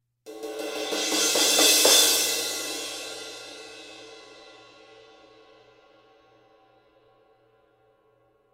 A Avedis Build Crash, cymbal

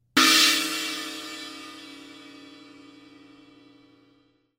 S China Inverted Crash, cymbal sound

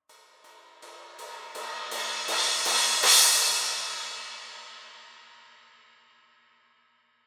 17 I Trash Crash crescendo hits, cymbal

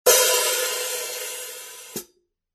14in Custom Mastersound HiHats, cymbal

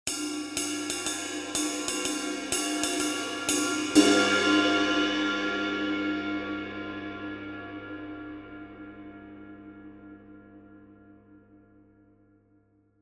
20in Crash Ride, cymbal sound

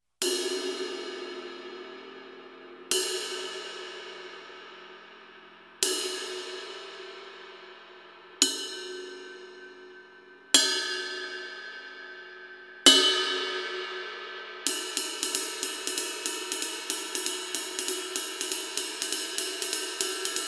23 K Sweet Ride, cymbal

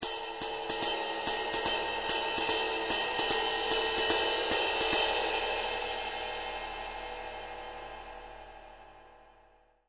20in Custom Session Ride, cymbal

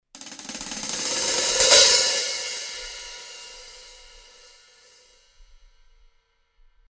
Crescendo Closed to Open, cymbal

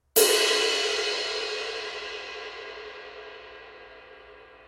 22in Dark Medium Ride, cymbal

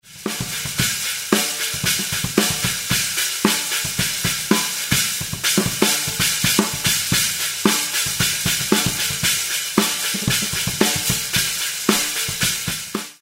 Spiral Stacker Over Oriental China; cymbal; 10 Oriental China

